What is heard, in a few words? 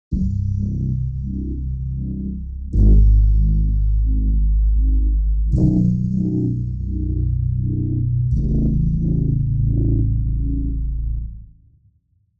boss phase downed head 172bpm